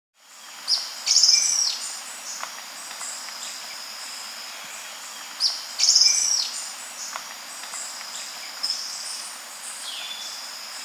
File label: Cinnamon-Faced Tyrannulet (Phylloscartes Parkeri), Andean Birds; S. America